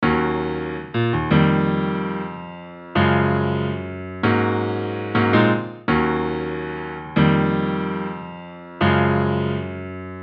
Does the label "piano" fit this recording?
Yes